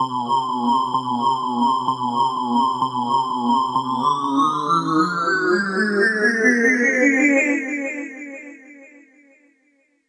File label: sounds synthy; REMIXABLE COLLECTIONS; Ze Franks Pain Pack; pain pack sweeps and fx